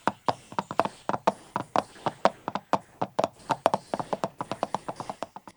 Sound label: clopping